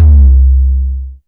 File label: TOM D LG; Back In Time Records; Big Bang